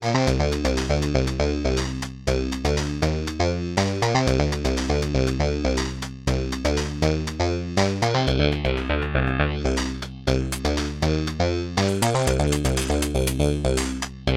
Linnaeus Filter ext F MOD MODOSC no Track; Rossum Electro Music

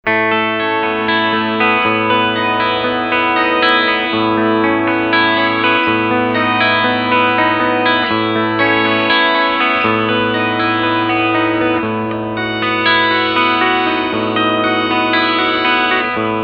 Neck Pickup Clean Picking

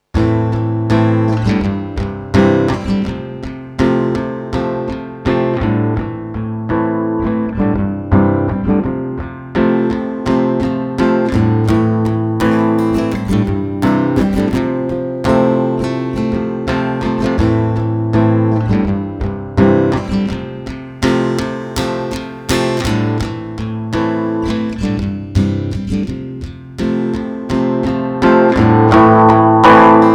eq shape; tech21

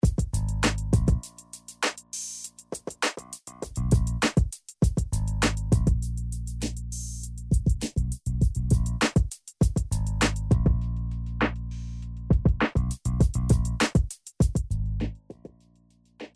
Loop EQ Cut

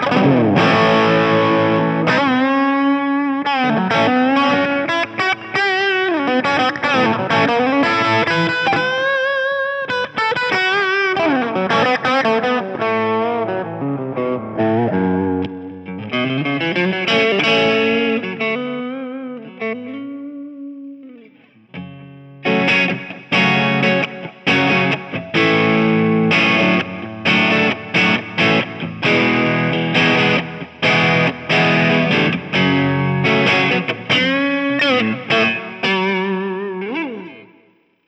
Sweet Soul; Valeton GP-200LT

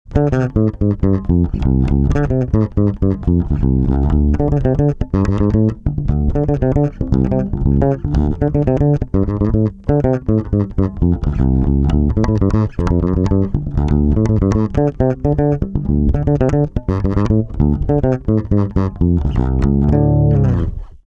Comp neutral

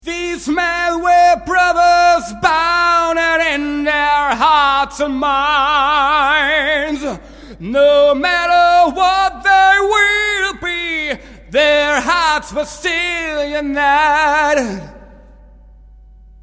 Reverb

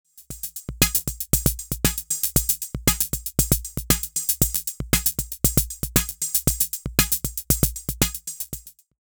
6equencer Loop

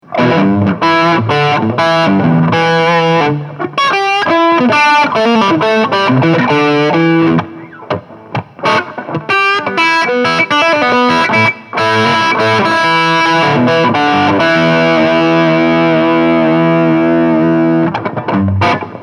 Tech 21 heavy blues

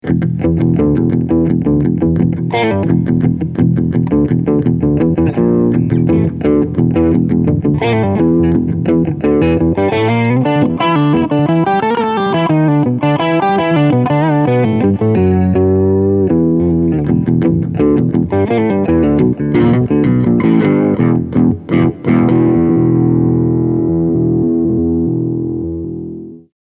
Octave Solo

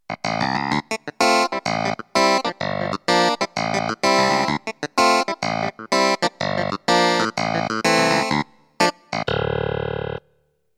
Roland FP30 Clavinet

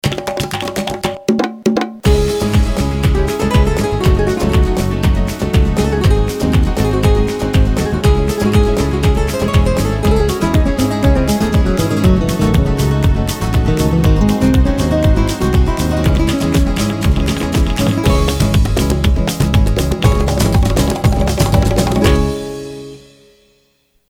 Gipsy Mood

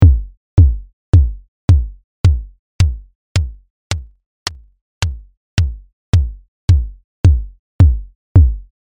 Hex Drum Click

